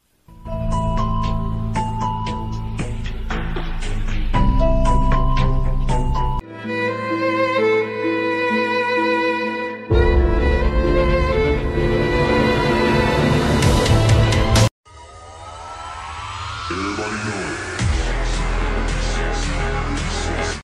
old song vs new song; free fire

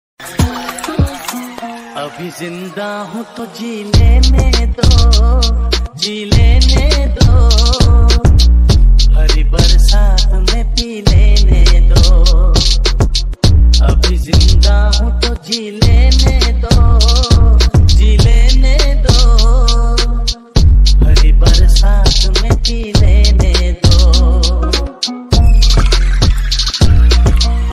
heart touching music

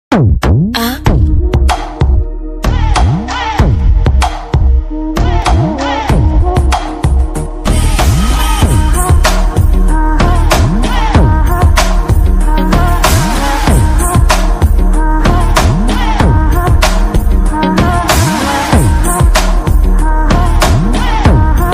instrumental music ringtone

